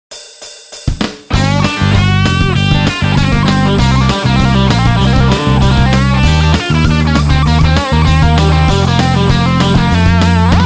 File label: patch demo